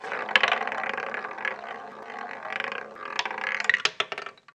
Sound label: wheel spin, european roulette